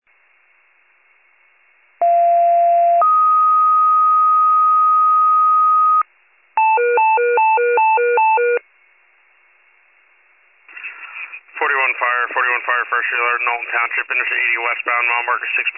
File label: fire, pager alert